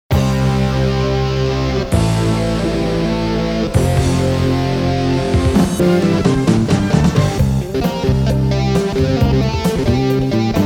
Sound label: Guitar B3